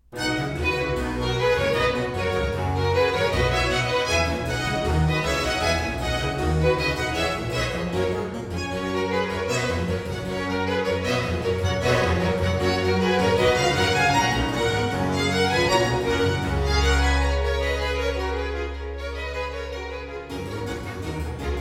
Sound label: Concerto in C major for strings and harpsichord Allegro; RV 117; A. Vivaldi; Concertos and Sinfonias; 尼古拉斯 · 麦克吉根